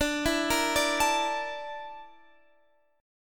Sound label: Bb Major 7th Flat 5th